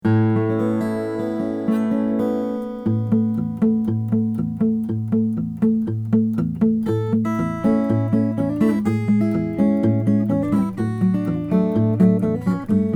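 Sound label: rainsong graphite ws1000n2 black ice, guitars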